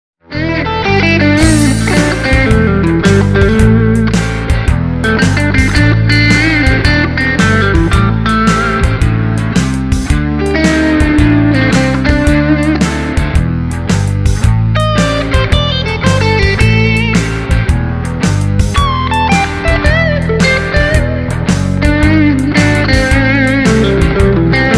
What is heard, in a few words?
classic blues; Tonerider